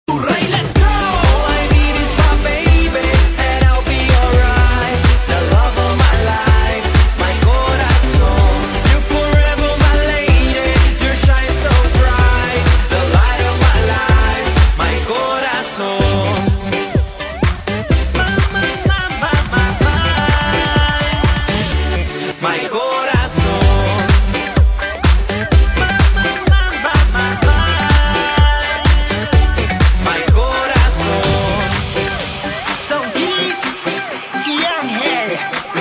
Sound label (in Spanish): reggaeton romantico